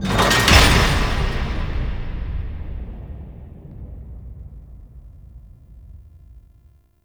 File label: Machinery Activate; Warframe; Tau; Cinematics; LastBreath